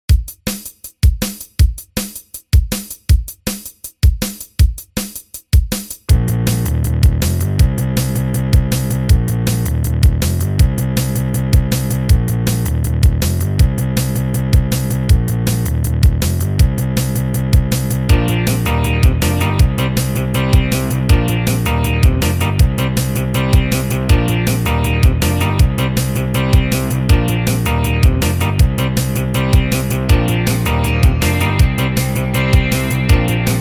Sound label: PROTEUS VX FEBBRE